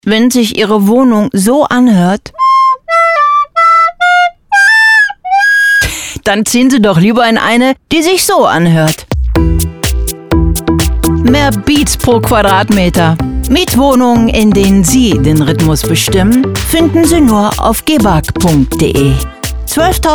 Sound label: House Beats